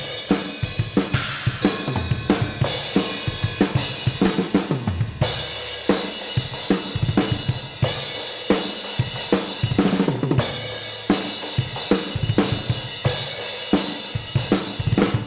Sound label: overheads hat ride